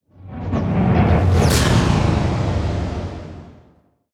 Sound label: Riser Hit